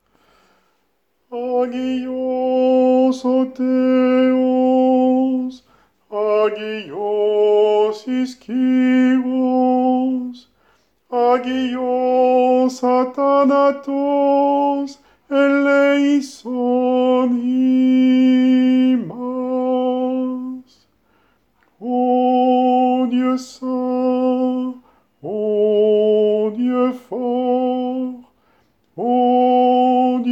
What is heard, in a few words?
hagios tenor